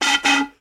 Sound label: pan pipe blow; musical